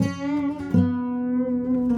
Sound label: Sarangi, Acoustic String; Sonic Foundry (Sony Creative Software); Friendly Fire